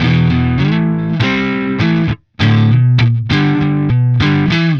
Electric Guitar, Loops; Guitars

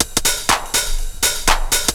loop; SoundFactory; SMPMANIA

